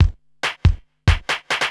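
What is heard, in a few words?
DRUMLOOP ELECTRO 140; Techno2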